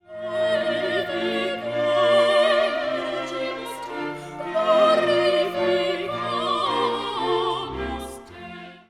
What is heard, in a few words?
Laudamus te Duet